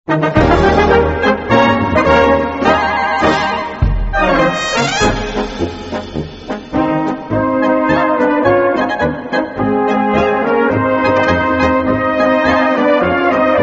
Polka

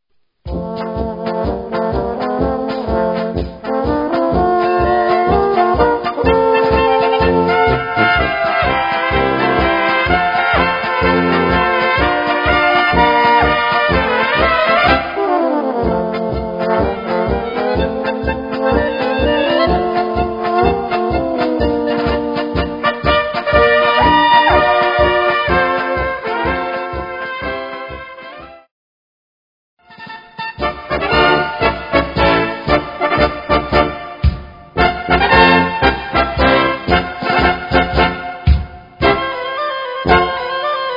Fuer Tenorhorn

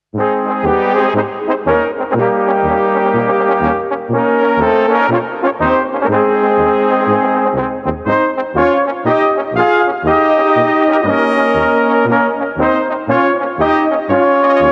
Polka

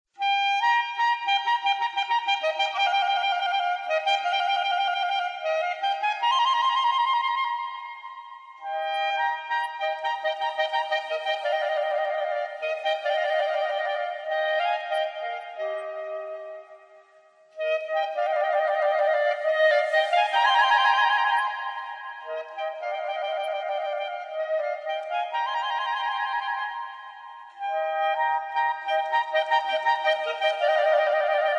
Duelsackpolka

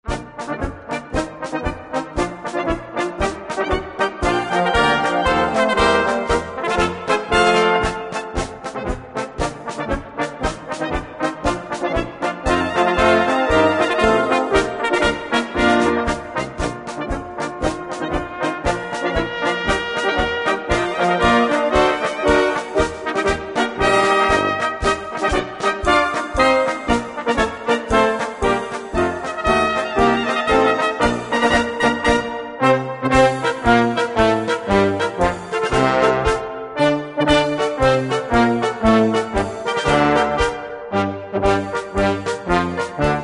Marsch

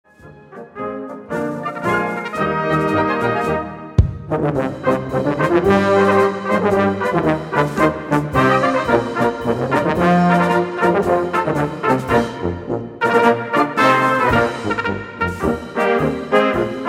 Polkahimmel

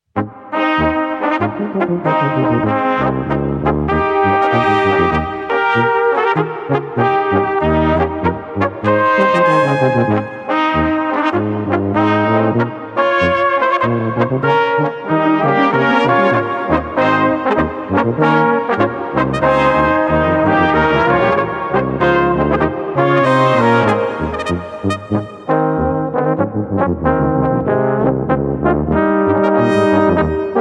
Brauhauspolka, BLECH; KB KLARUS

